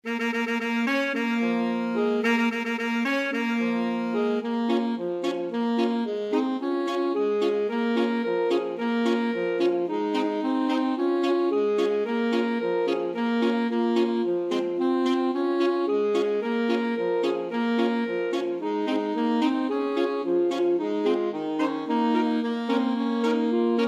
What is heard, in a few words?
kangding, alto sax quartet